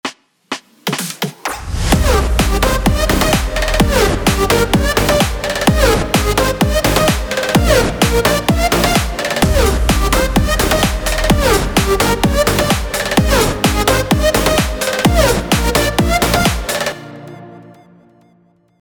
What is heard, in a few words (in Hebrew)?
טראק